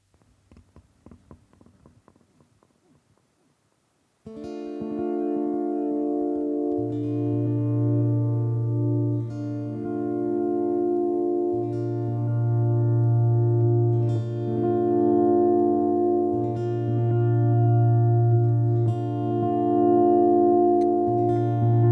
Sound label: F100 GTR Vol Pedal motif